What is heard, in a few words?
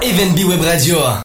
JINGLE; EVENTBE